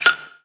lighter; serioussam2

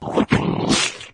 death, flood

